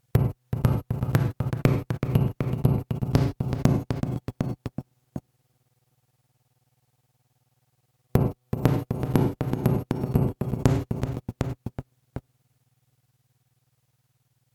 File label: gamma drums clean